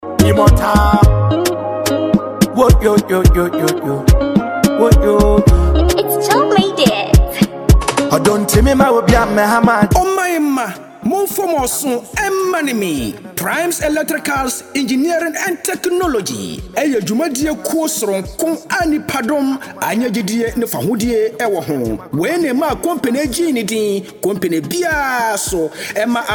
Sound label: jingle